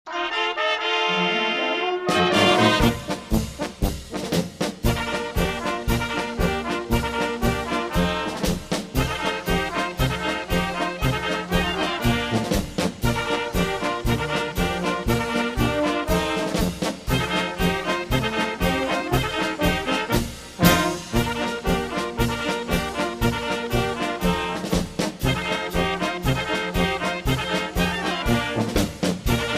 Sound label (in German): Trompetenecho